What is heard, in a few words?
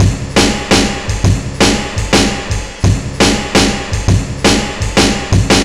170, drum loops, drum & bass